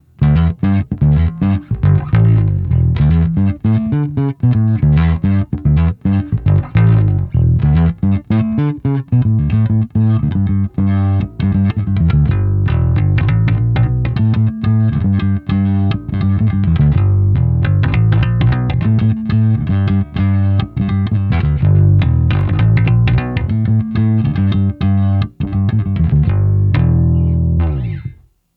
Gallien Krueger 700RB

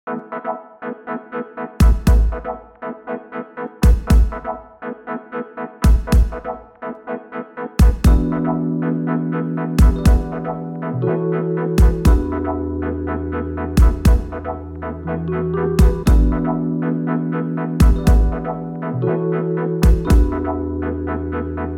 Light Reverb; Tracktion

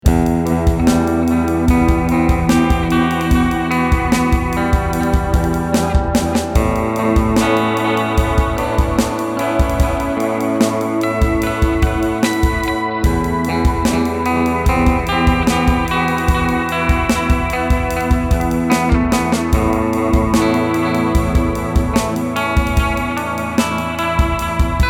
Drum Guitar 16 beat

